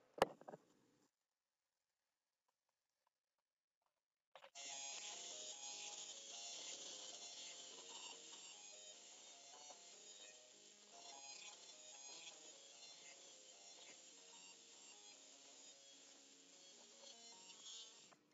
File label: opl